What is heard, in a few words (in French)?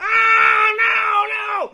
Cris homme colère